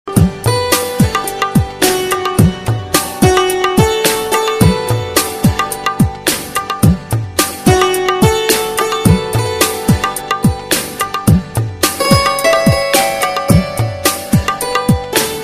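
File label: Music, Bollywood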